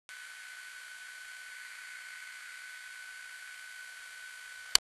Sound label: high quality camera sound; equipment